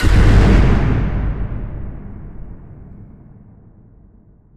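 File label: explosion distant medium; Source Engine; Portal 2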